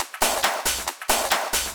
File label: Groove 137; Dance Mega Add On Grooves